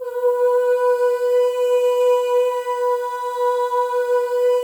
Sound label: MOVING V1, Symphony of Voices; Spectrasonics